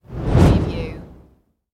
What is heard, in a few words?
Fireball Swoosh